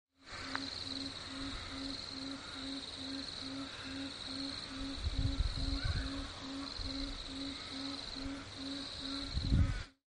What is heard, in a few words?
Papuan frogmouth